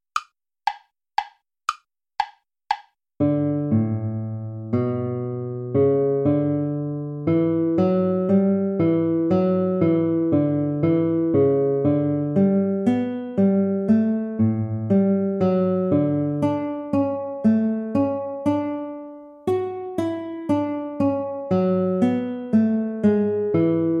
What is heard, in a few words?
minuet play along